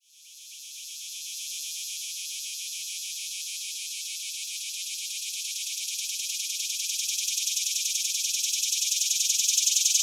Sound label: Neotibicen tibicen australis, cicadas songs; FL; filtered